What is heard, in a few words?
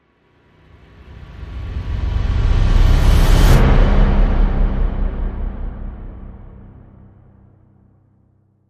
Holiday Rolling Perc Reverse